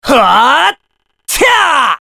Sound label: warrior finish attack